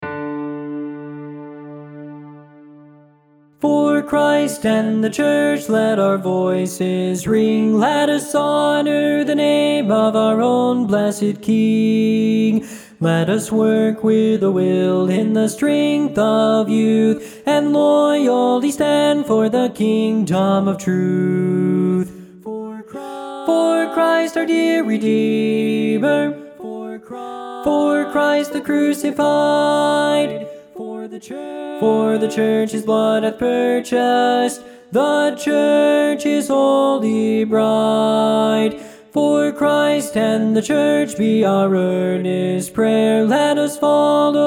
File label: TENOR